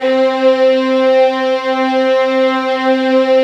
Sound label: Violins, Arco; Arco Violins III